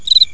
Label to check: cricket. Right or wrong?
Right